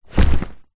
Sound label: foley bag rucksack set down carpet